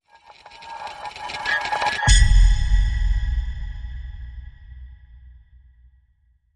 Desolate Playground Eerie Boom

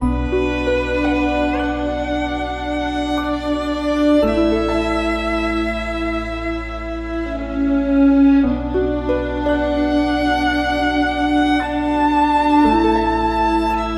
orchestral legend

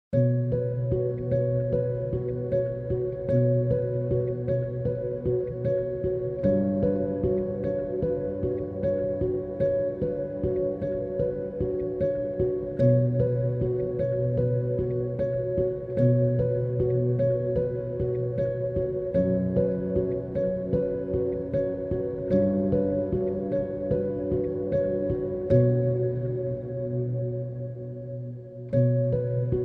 Arpeggios

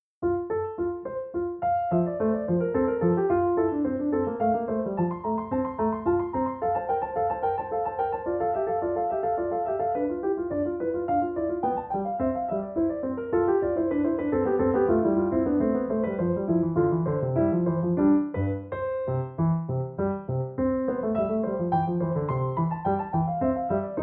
Invention In F Major; BWV 779; Johann Sebastian Bach (PD)

